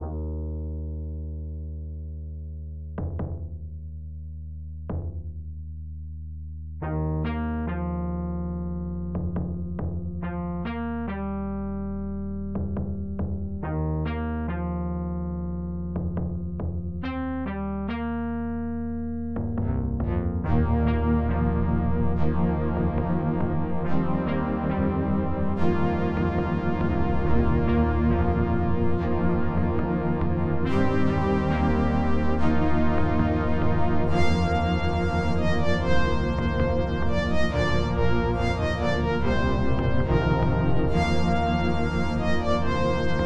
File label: orchestral mega drive